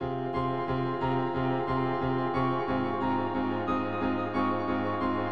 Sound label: Piano Before; Slam Pro